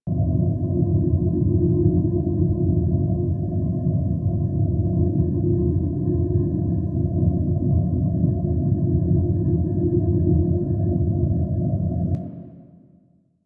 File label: Bridge Hum; Warframe; Liset; Hub